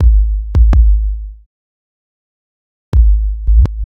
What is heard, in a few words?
Subtractive Beat